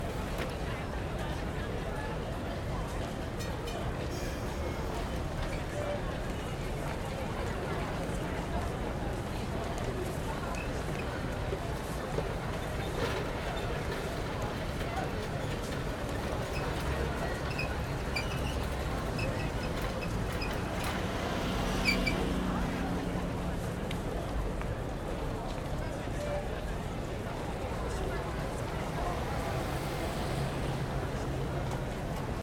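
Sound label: London street exterior evening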